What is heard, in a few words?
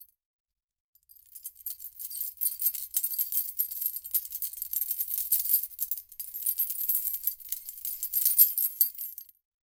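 a pack of monkey scattering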